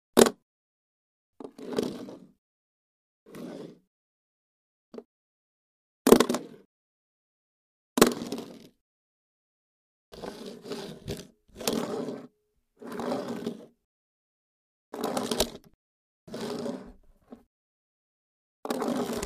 Series Of Several Drops And Slides Of Tokeroav Automatic Pistol. Dropping Pistol And Sliding Across Wood Floor. Close Up Perspective. Guns.; foley gun movement; 9 mm Tokeroav Pistol